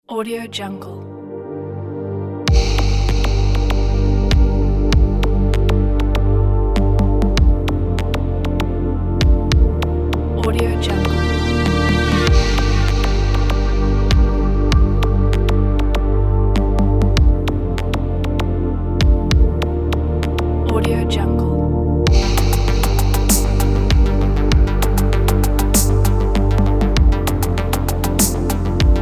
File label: relaxing electronic ambience; his-rawest-majesty